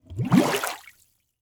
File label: Liquid Water Water Bubbles Blow Bubbles Into Water Short